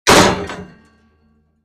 Metal Gate Single Ban, impact

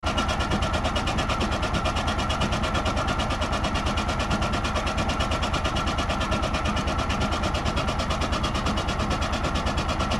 Motor Chugs Noisy Mac, factory

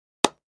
Axe 2x4, axe hits

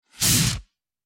Short, Heavy Fire Extinguisher Air Blasts, X6

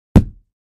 Impact Hard, rocks & dirt

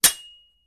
Fencing Clanks Singl, swords

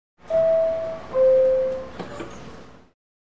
Subway Interior Door, subway

